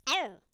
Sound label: aouch